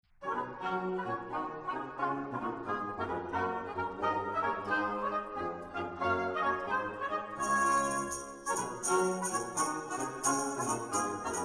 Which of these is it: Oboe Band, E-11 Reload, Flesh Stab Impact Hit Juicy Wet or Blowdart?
Oboe Band